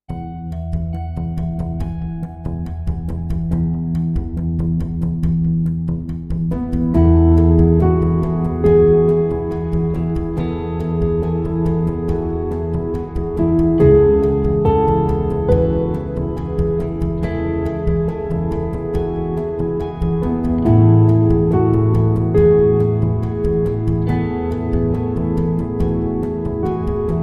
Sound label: Enchanted Miracles No Triangle Percussion